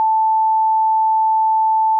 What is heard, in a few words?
sine 880 -12dB